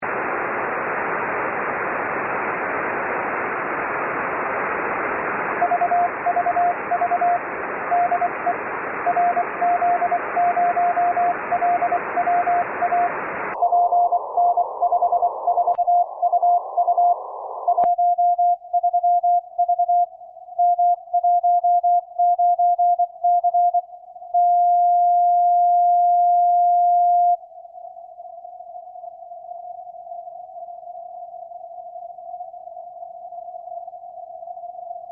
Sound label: 144.400 CW FT-847 CW FILTER 500Hz; NAR; NR BPF; mayak